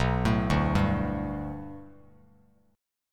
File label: B Minor 7th Sharp 5th B Bass Standard, chord; 7,12,0,0; Bm7#5 arpeggiated; JGuitar